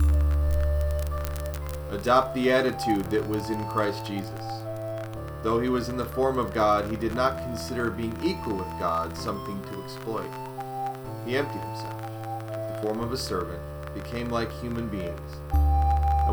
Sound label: Wondrous Humble Crackling